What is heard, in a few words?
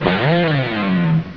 GUITAR